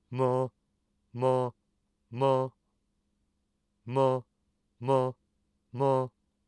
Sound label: inspiration chest voice